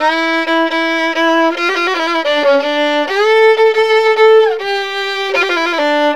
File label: VIOLIN; USB Soundscan vol.63; AKAI